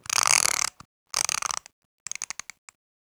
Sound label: winding up toy